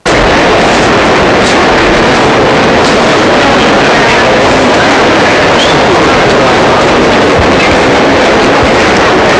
FOOTSTEP, PEOPLE; Easy Personal Web Page

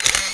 screenshot; xfire

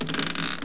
chest; landslor

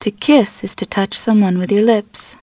kiss

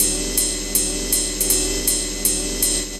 PERCUSSN, Drum Bass, 160; eJay

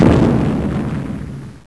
explo

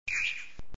bonus; horsey racing

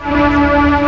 noise syn; synths

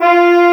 french horns F3; Star Trek JR Audio

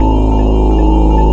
droid; wipeout

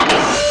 OPEN START, doors; shogo

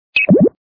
power up; Ataque de Esporos

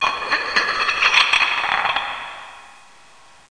Move Tile

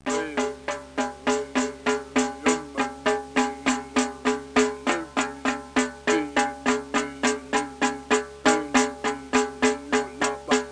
tom tom; WAV Sound Effects

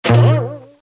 boing out

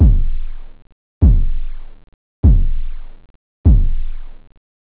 hard, drums technobd

